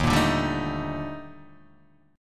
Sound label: D sharp Major Sharp 11th, Guitar, Open D